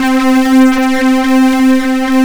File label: BASS C4